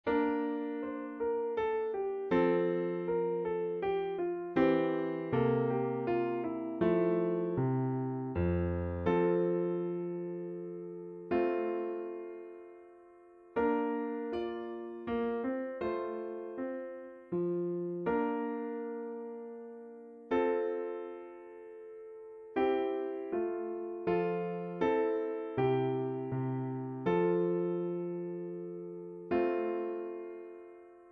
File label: Mallet Acc; Minuet Mallets; Mallets Solo